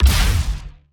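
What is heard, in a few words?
Laser gun Ballista Fire Short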